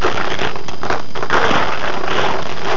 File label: SHUFFLE; BRAIN ACTIVITY